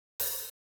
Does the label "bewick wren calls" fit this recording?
No